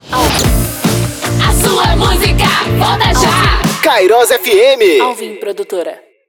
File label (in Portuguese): ENTRADA BREAK; PACOTE ENTRADA E SAIDA BREAK